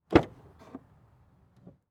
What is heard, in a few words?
Chevy Trailblazer Open Door Exterior; transportation